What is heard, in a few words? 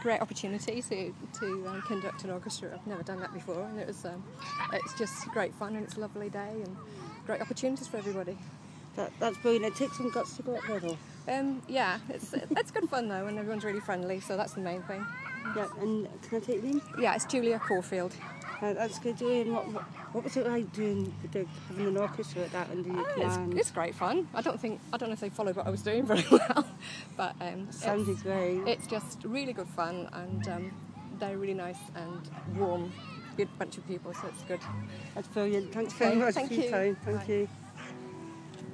orchestra manouvers audience participation